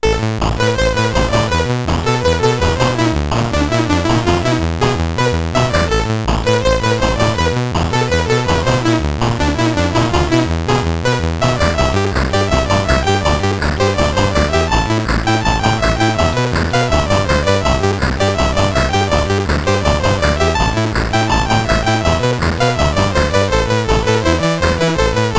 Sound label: Music BASIC, sid CSG8580R5